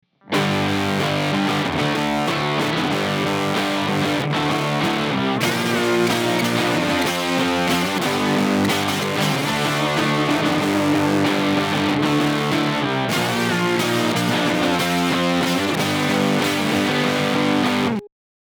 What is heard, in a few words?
Full Mix